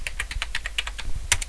laptop

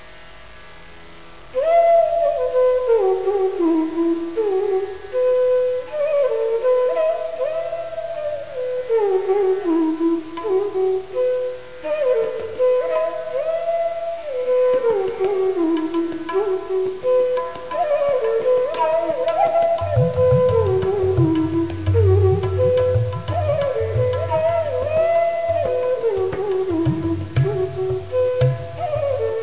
man music

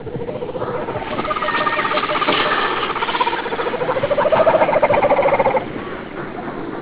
Jungle, ambience

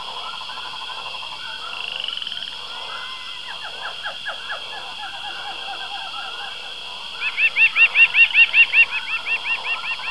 camp amb

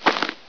snow

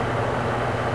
steamy

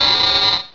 apito

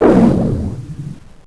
pit drone pain; svencoop; sectore

